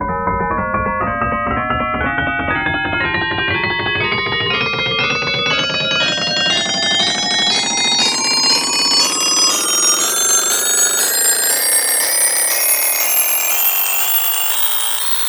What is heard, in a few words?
telephone; tonleiter